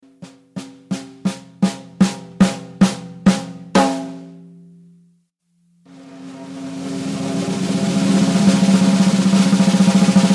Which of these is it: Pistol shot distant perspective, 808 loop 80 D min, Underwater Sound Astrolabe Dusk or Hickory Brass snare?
Hickory Brass snare